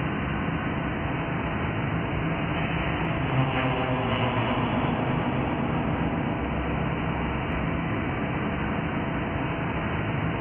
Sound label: hallway moan loop; svencoop; rngremake